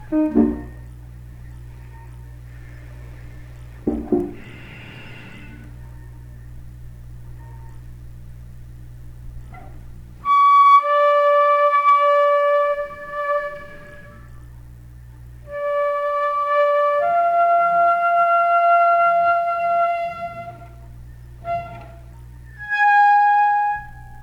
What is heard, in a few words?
practices the tuba; mr bean